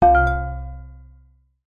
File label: multimedia alert notification ui ping chime mallet like error; zapsplat